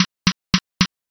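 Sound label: footsteps asphalt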